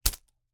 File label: slingshot, dry firing, slap, rubber, rustle, shot; WristRocketPro; BlackMamba bands; Eiravaein Works; Vaeyan IV